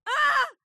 Iguane Aaahhh; mirumiru

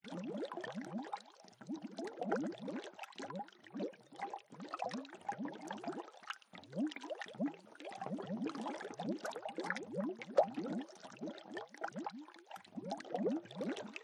Bain bouillonnant; mirumiru

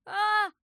Herisson Aaahhh; mirumiru

